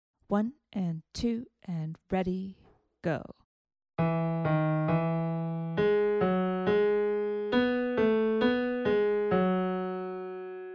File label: melodic dictation